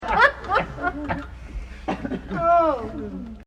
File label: Light light laugh